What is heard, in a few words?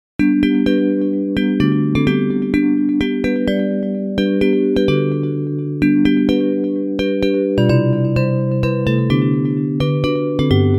Bells